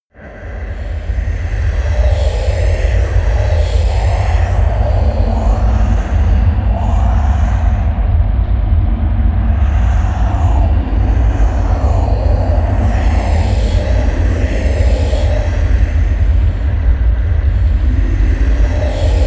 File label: atmosphere voices from hell, Horror